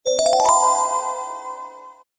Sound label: fantasy flourish low magical bell